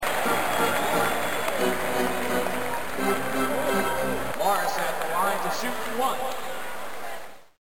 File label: bball crowd